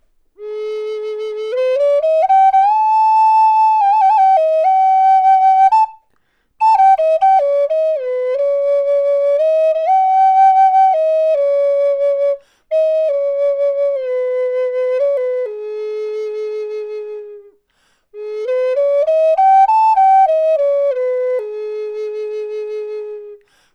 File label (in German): Hoerprobe A Floete Birke Nuss 432 Hz